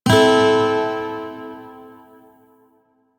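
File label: Shawzin Pentatonic Major Chord, Music; DE; Warframe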